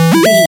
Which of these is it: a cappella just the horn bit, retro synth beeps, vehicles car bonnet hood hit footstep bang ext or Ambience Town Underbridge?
retro synth beeps